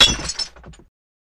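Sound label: Bottle Break Generic; bottles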